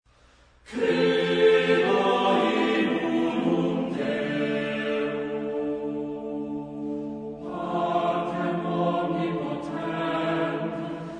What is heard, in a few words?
Mass for Double Choir Credo; Martin